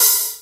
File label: prominent open high hat sound e key